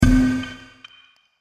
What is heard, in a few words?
Sci Fi Impact With Scratches; Sci-Fi Impact With Stutter; Stutters